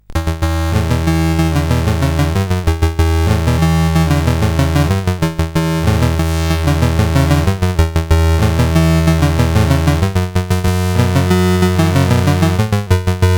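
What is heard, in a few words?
Magicians Ball, MOS 8580 SID chip music, games; CSG8580R5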